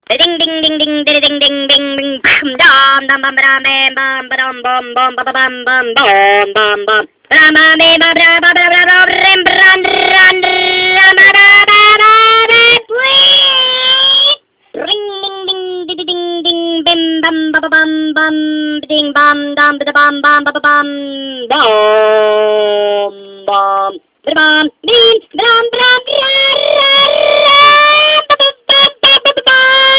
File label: Trabant WRC